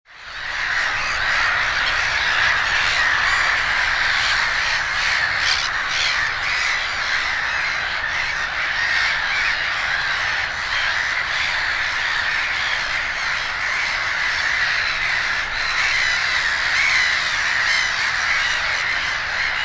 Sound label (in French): Mouette rieuse Chroicocephalus ridibundus; les espaces naturels proteges de la cluse du lac; Natura 2000